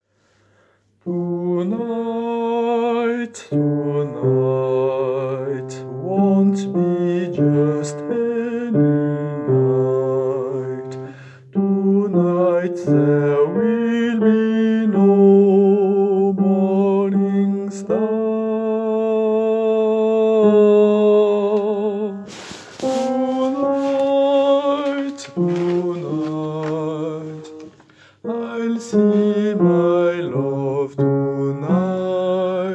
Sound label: basse